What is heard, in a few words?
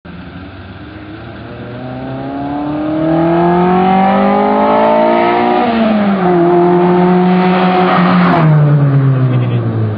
flyby, sports car